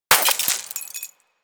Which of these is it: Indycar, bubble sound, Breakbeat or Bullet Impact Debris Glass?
Bullet Impact Debris Glass